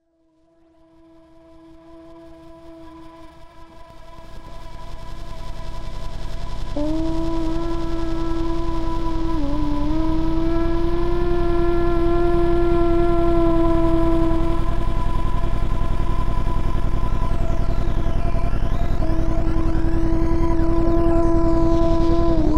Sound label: engine is calling coming calming